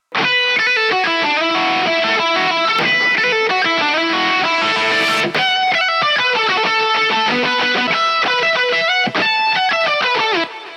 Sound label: guitar